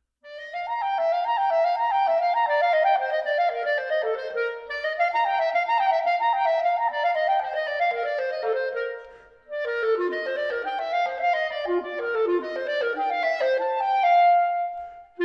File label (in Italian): Studio Per Clarinetto Solo